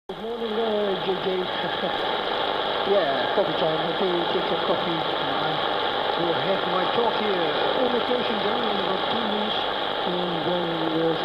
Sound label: 5815 kHz, pirate radio; orion